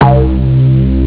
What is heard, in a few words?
stacko bass; ST-XX Amiga Soundtracker Sample Packs